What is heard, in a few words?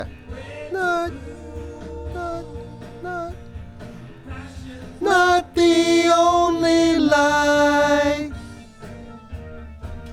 VOX Back